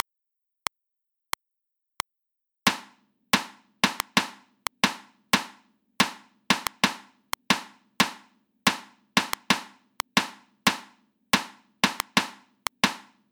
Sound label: rhythms 16ths at 90 bpm